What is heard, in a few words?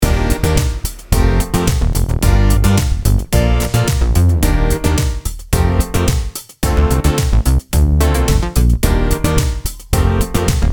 summer party funky pop dance